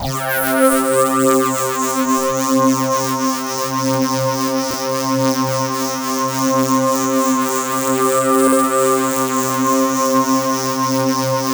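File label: RING SWEEP left, RING MOD; Spectrasonic Distorted Reality 1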